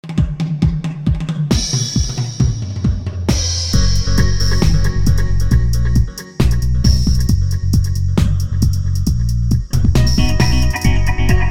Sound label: thunder dub